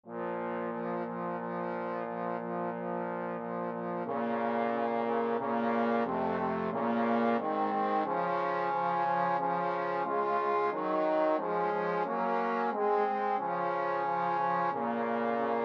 pavane, trombone trio; Warlock, Peter - Capriol Suite 2. Pavane

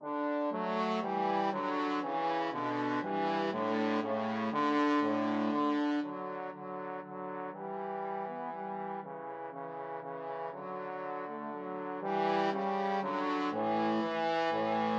Menuett, trombone duet

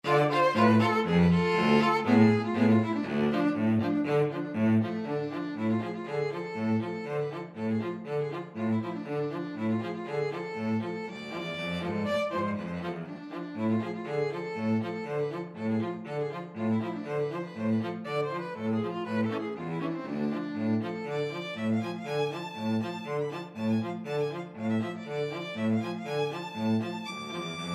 freilechs von der chuppe, string trio

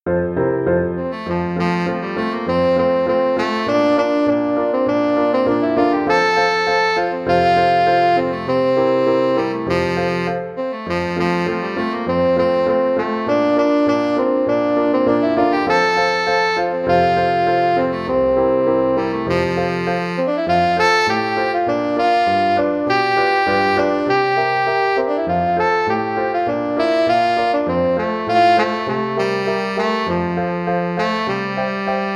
tenor saxophone

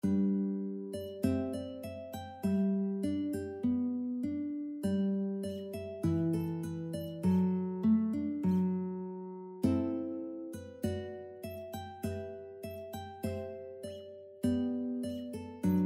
story of a small town guitar

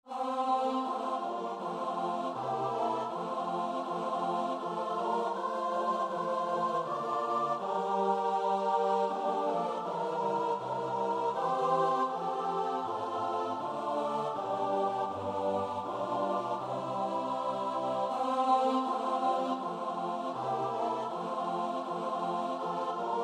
choir

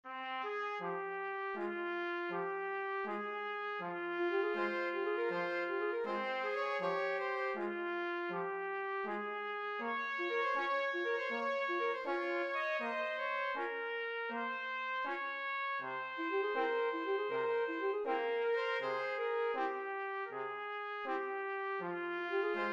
flexible mixed ensemble, 4 players

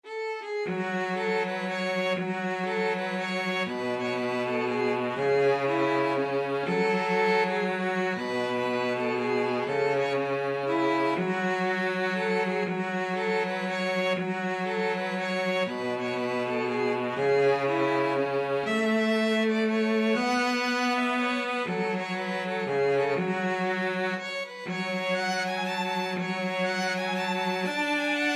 violin cello, violin cello duet; carolans

